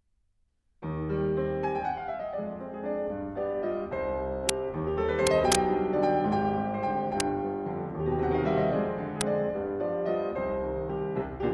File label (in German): Abendmusik, Con passione; A. Jensen